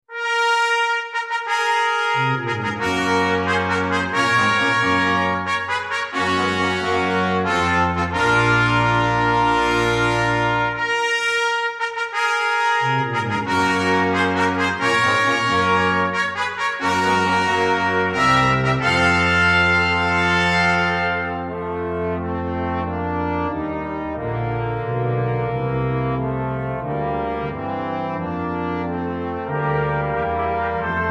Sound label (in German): ehrungsfanfare